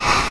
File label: singe; redeclipse; weapons